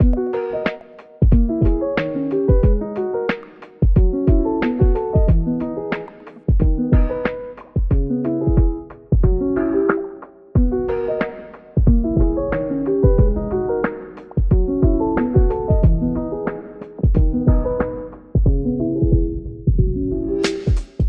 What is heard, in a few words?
Lofi Music Intro Stinger; Kawaii Love Lofi Music Pack 2